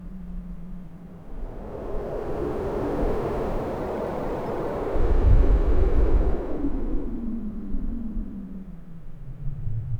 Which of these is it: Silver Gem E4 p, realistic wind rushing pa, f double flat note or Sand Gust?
realistic wind rushing pa